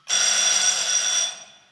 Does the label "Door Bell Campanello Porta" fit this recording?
Yes